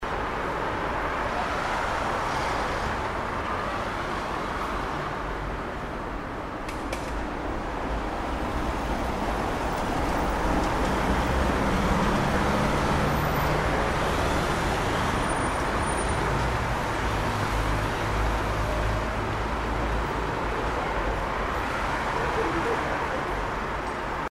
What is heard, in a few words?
traffic